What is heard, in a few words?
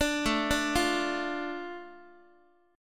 A Suspended 4th Sharp 5th A down Guitar, chord; Asus4#5 arpeggiated; JGuitar